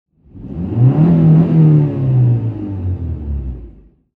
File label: Transportation Cars Honda Civic Rev Single Rev Engine Medium